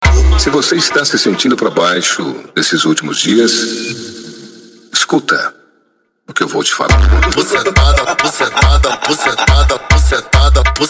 bombox funk

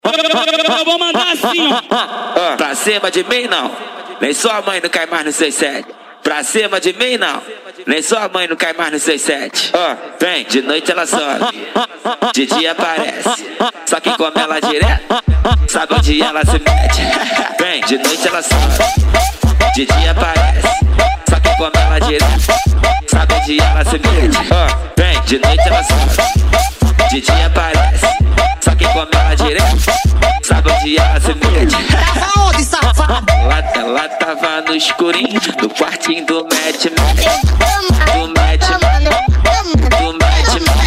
funk agressivo ritmado e funkadao

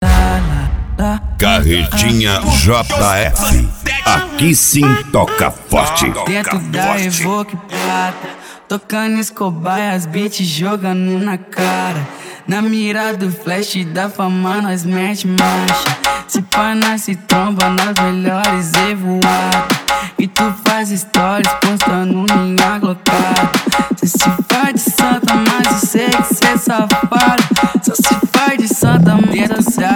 funk mega funk

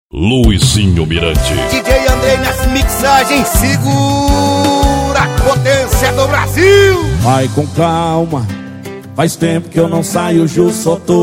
sertanejo com locutores de rodeio

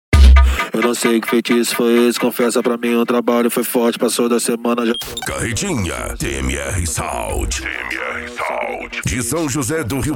esp insane sound

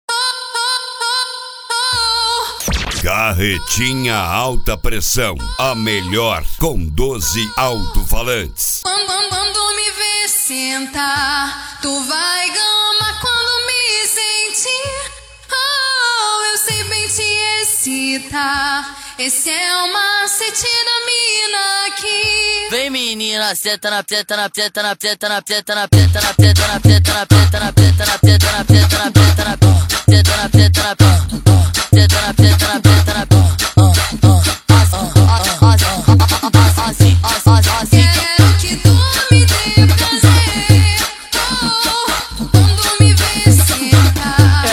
ritimada agressiva

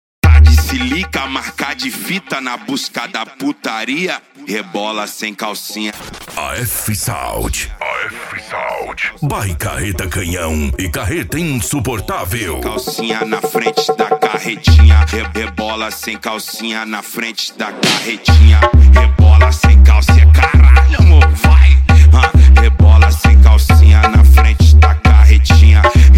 esp funk automotivo; afsound

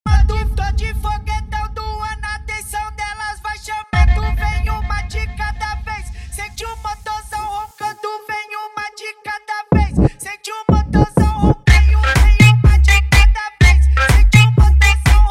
mega funk automotivo

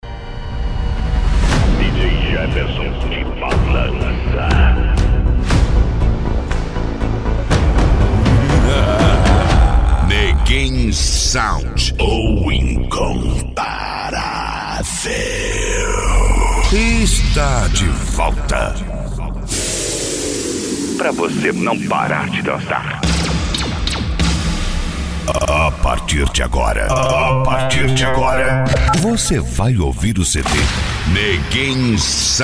summer eletro hits mais funk

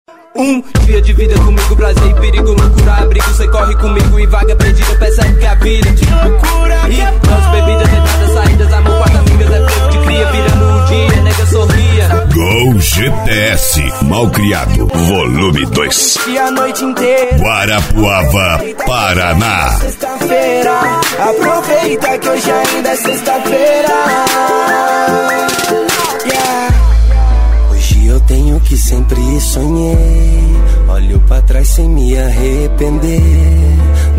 sertanejo e hip hop; gol gts do malcriado

